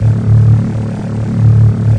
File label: dark bass; Basses